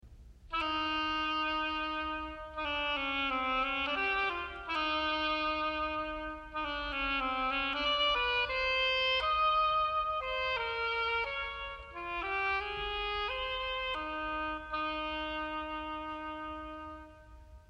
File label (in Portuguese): oboe